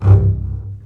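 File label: basses C1 marcato short; East West - The Ultimate String Collection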